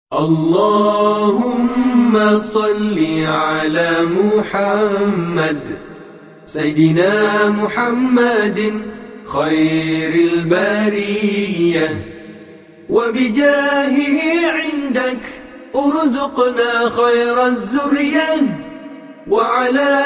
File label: Selawat Khairul Bariyyah (Acapella)